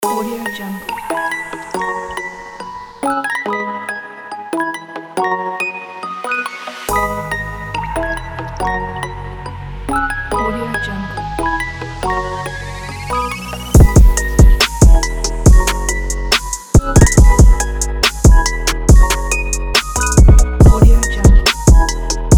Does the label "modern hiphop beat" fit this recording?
Yes